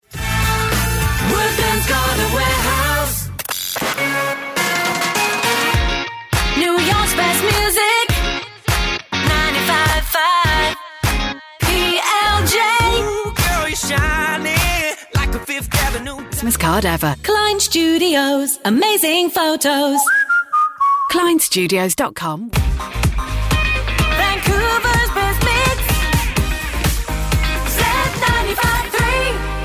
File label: jingles singing